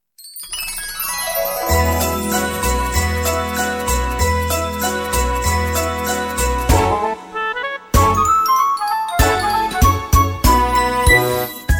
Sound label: instrumental; deti pojut na russkom jazyke